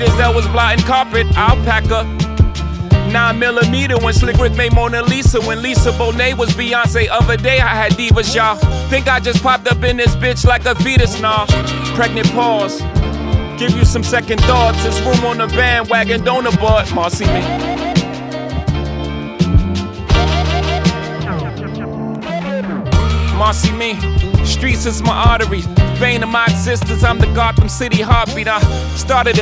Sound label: quarteto